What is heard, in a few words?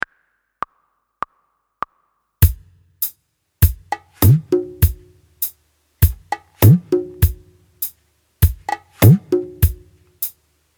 Slide Practice With Tabla